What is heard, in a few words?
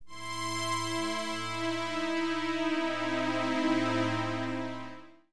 spk pad; HipHop; LiveAct Pool 1